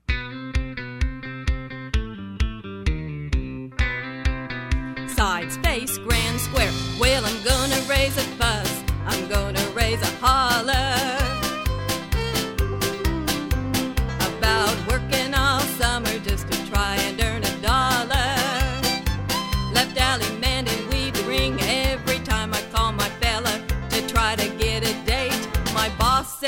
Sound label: vocal, square dance music